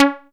Synth Cuts; Vengeance Samples; VES1 Instruments

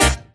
Synth Sound; Vengeance Samples; VEE Synth Shots

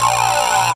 Vocoder Oneshots; Vengeance Samples

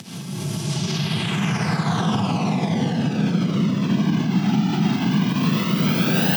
Uplifter

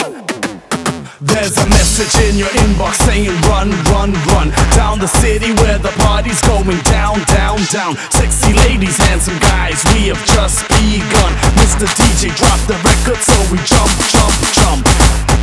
Rap Mix, 140 BPM; Vocal-Hook Kits

